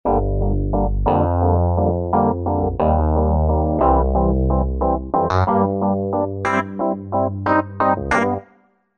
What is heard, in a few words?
Wooly EP, Roland V-Synth; Factory Patches